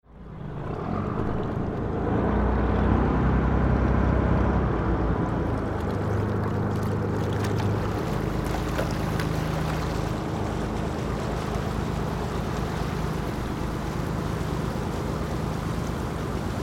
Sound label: boat